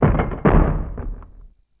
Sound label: Drawbridge Slam Down